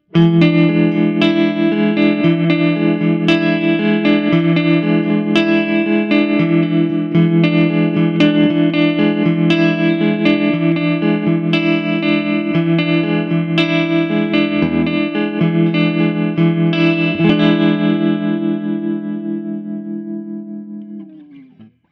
Crazy Tube Circuits White Whale Effektgeraet Trem Opto Rev Medium

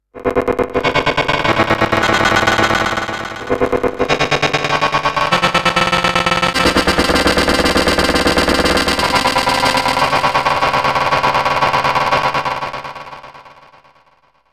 Granular synthese With Amp Envelope; Emu EIV classic und Ultra

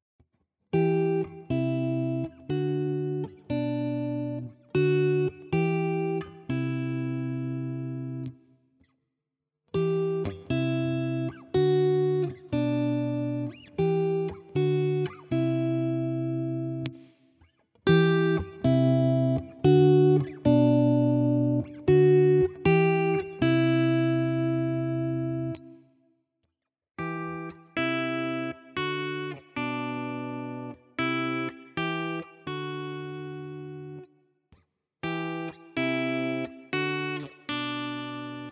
Ibanez AR520HFM clean all positions Dezimen

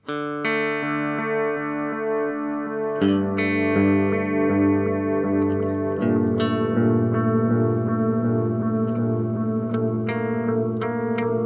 Boss DM 101 Classic mit Modulation

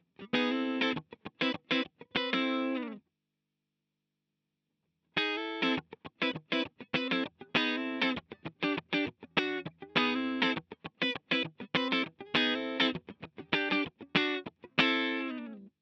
Teletronix LA-2A Bypass dann Peak Linksanschlag; UAFX

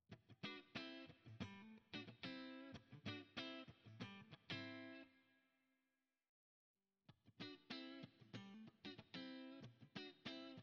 verschiedene Cabs; ToneX Pedal

